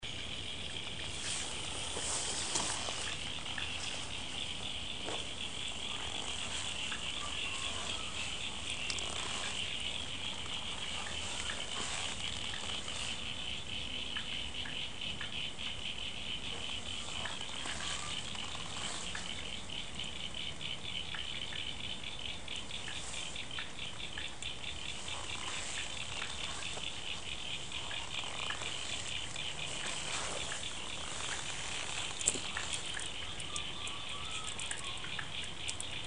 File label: frogs after rain